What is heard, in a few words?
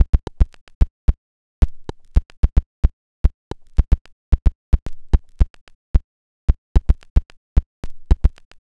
Click Trick, Modular Electronica; Sonic Foundry (Sony Creative Software)